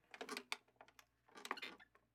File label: Sitting On Wood Bench Movement; Fairlight Sound Lib